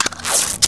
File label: small medkit; svencoop; sence; mistake coop